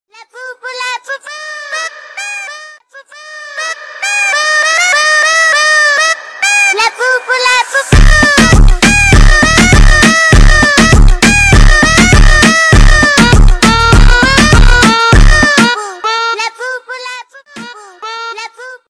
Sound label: reggaeton; labubu